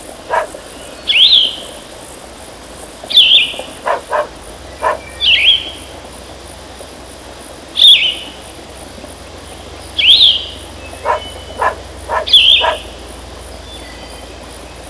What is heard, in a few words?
FOREST; Klondike Gold - An Interactive History